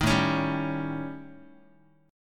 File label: A 7th Flat 5th A, Guitar; A7b5 strummed; 6,5,4,5,x,x; 4A,4E,5A,5D,5G#,6C#